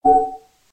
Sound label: usb disconnect; Motorola Backflip